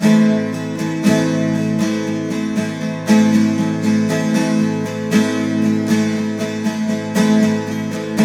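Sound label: 12 string minor A#, guitars; Spectrasonics; Hans Zimmer Guitars VOL-1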